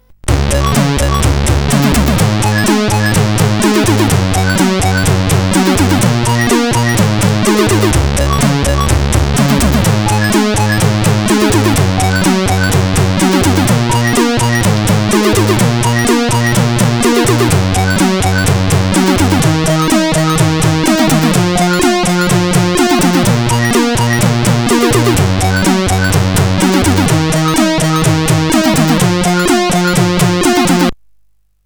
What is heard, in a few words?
Music CSG8580R5